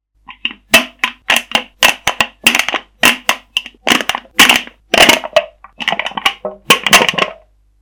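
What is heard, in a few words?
jars sound; zvuk banok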